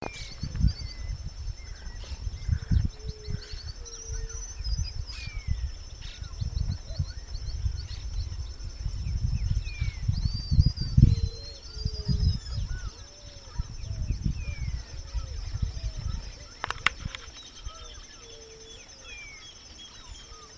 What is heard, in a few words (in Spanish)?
Tijertilla, Tijerilla (Xenopsaris albinucha)